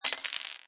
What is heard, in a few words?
Cartoon Crash Broken, Break glass or break cup; Comedy/Cartoon; Drop object